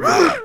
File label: Ballista Death Vocals